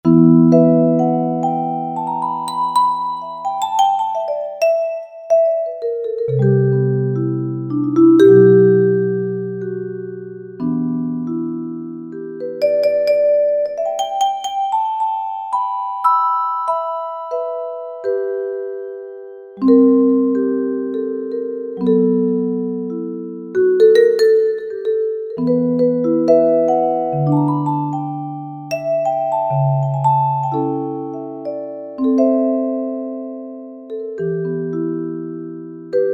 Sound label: E-mu Proteus VX Velo Vibes